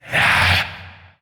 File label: Possessed Red Veil Vocal Attack; Warframe; PriestQuest